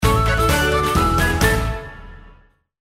VIRGULE DANSE BRETONNE

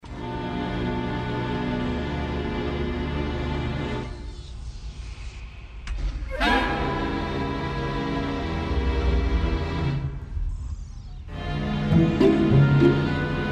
SERIE ATTENTE CONVERSATION

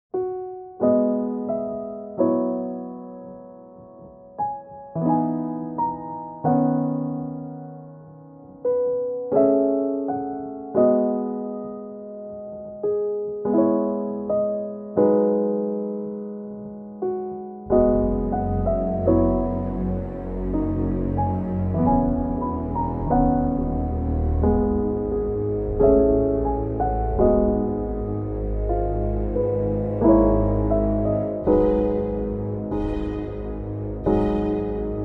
TENDRE ATTENTE

